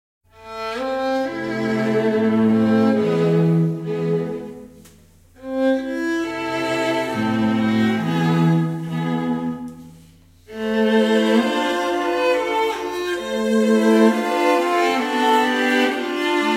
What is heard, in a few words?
String Trio; Taneyev; Gavin Bryars